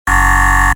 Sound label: reveal incorrect sfx